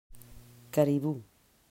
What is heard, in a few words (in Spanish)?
caribu